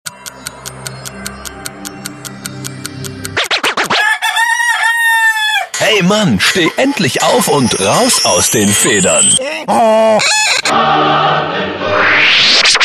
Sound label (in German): weck klingeltoene wecker